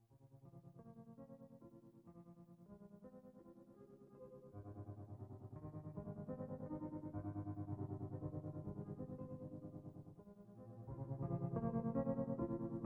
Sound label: Tranquil Rain Upon SurFaces; Thanos Chrysakis; Madrid